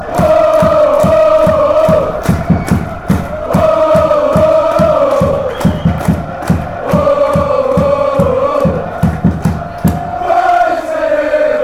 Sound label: woah, fan chants; sc heerenveen